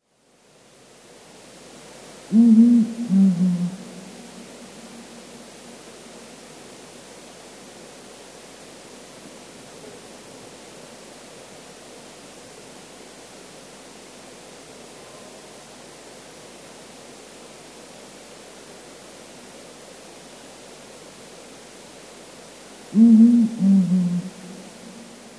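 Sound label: Blakiston's Fish Owl, ashoro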